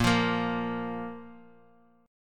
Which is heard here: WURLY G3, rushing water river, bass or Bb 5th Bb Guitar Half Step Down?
Bb 5th Bb Guitar Half Step Down